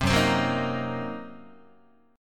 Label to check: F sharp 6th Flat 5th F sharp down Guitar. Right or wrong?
Right